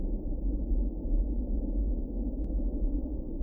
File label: Engine Drone Ext